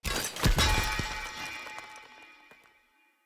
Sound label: Helmet Drop; Friendly; Tuvalkane; Discovery